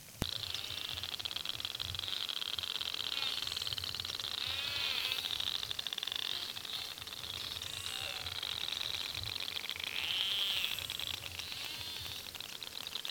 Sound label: Narwhal